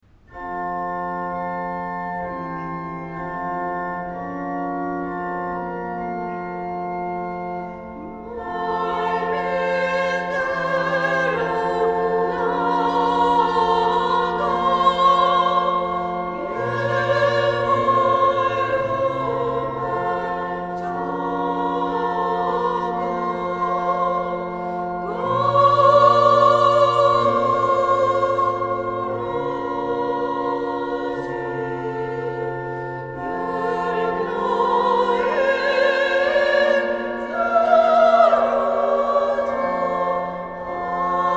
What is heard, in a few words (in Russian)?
Армянская месса; Тело Господне